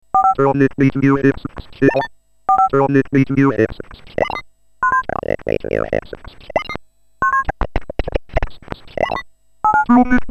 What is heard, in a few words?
Speakjet